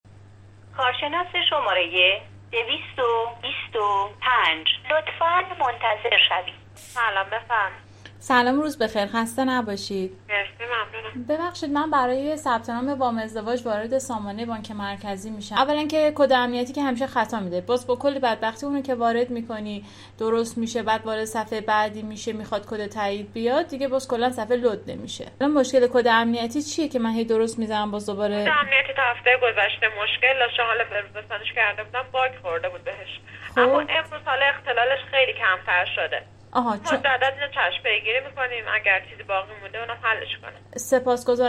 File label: error